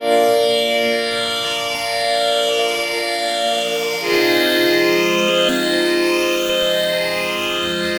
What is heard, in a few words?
Phastor String; Strings & Pads; Sonic Foundry (Sony Creative Software); The Electronic Music Manuscript Collection